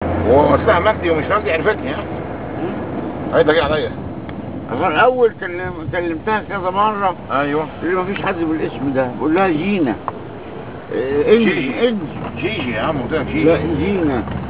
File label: taxi, egypt